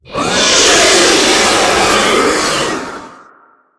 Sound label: spider dying